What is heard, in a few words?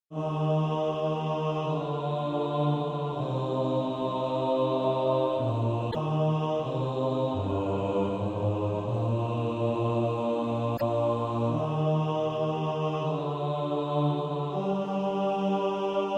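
bass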